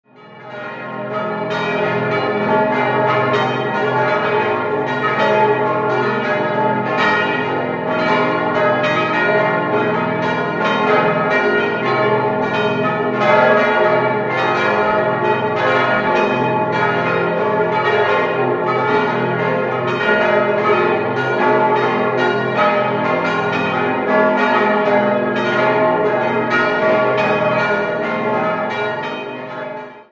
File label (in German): glocken; Abensberg